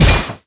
chest land; fairyred

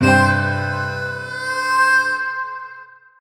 FS medium Win; dead or alive 2

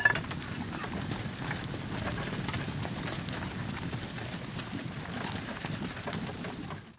auto play; excalibur

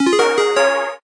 basic joker win; mega joker

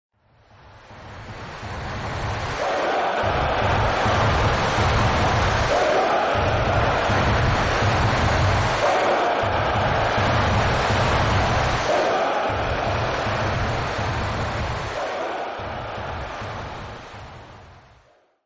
crowd Chant England, football

